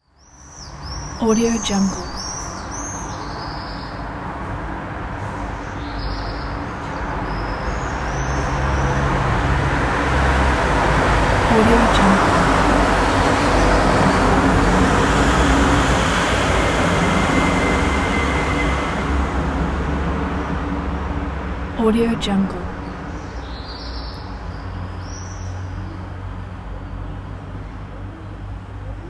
city traffic and ambience

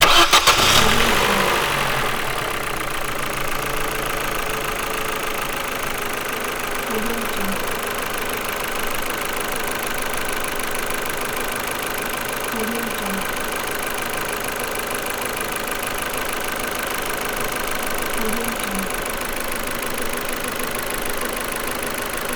engine idle car ford focus

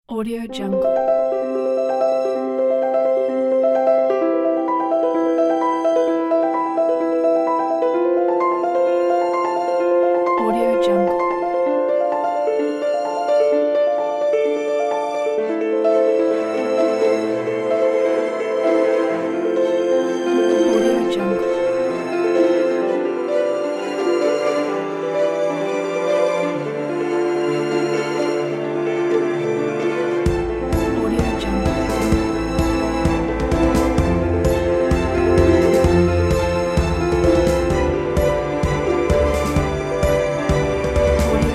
piano dream creation

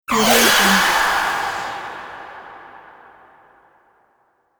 magical sorcery spell

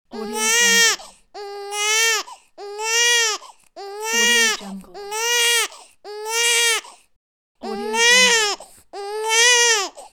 baby cry

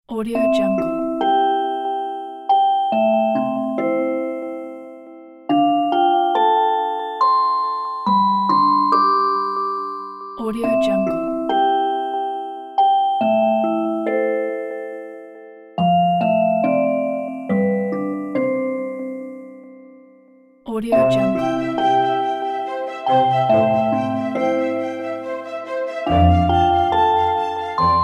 mom lullaby sleep baby

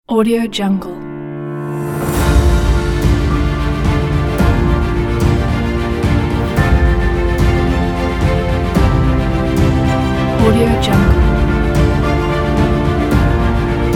be epic

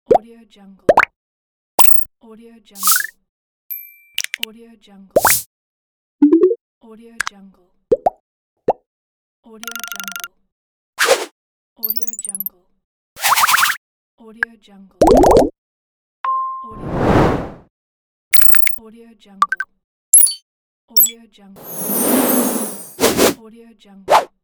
infographics sound effects pack

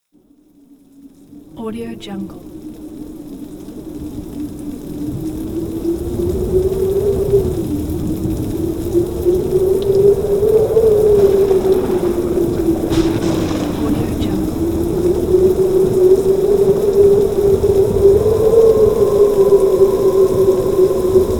ghost wailing wind thunderstorm

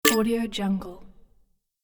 get coin game sound

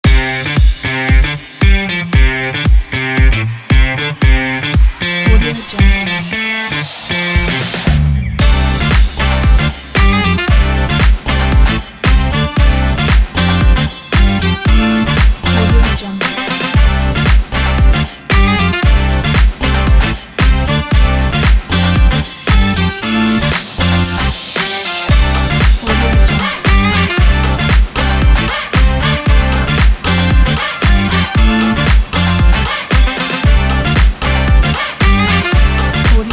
funk; fassounds ne

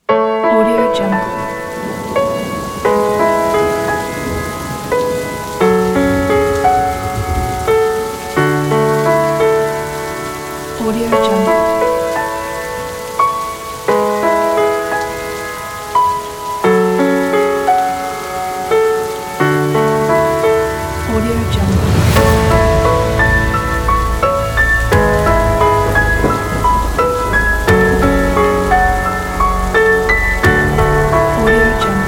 piano in the rain

